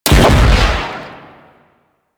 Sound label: Sapient Pistol Explosive Impact; Warframe